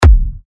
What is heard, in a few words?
TECHKICKS E, techno kicks; Colosseum; Revealed Techno Kicks Vol. 10